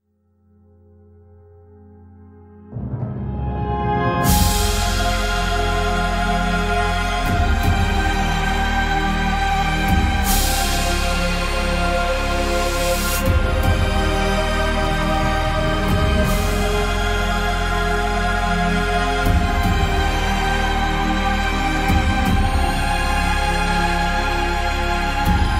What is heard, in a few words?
symmetry; gris